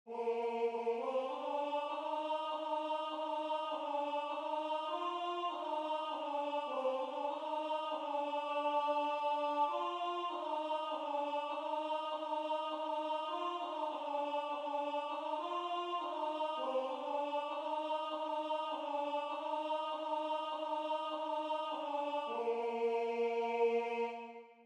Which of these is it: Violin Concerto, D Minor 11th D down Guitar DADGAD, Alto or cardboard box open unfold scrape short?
Alto